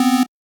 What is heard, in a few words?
Chord